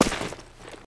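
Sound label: player gravel, footsteps; csgom; cstrike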